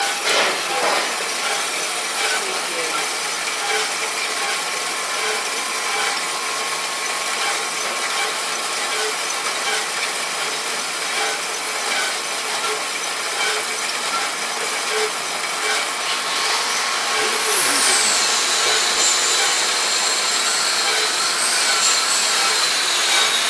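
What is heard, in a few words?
Industrial Factory Ambience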